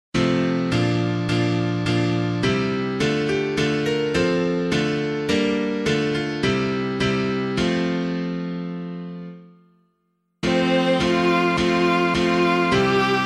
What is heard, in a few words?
piano